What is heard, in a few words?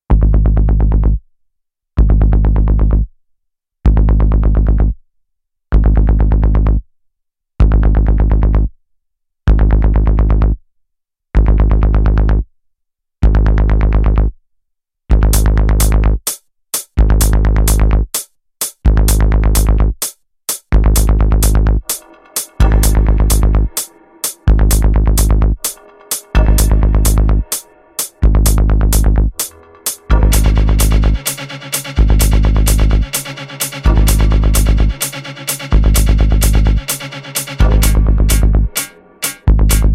remix